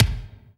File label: BD, DR BD; Best Service Gigapack 2